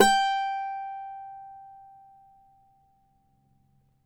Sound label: CAVAQUINHO G, Guitars; Spectrasonics; Hans Zimmer Guitars VOL-2